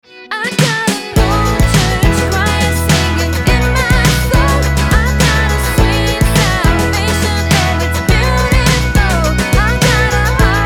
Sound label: old church choir